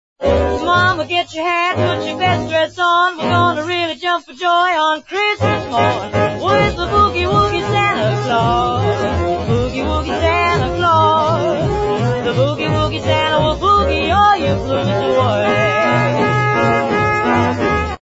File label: Filtered Excerpt; Mercury